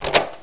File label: door; quake2; coop